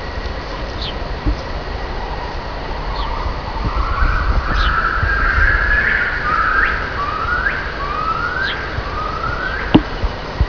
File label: Duet, gibbons